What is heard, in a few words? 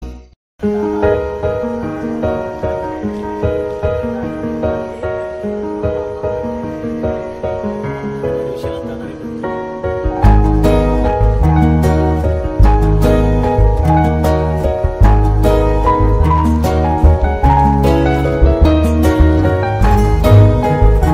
heart breaking love music bgm